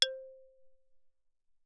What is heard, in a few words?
xylophone rosewood ff C5 B5